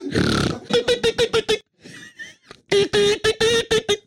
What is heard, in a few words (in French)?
rire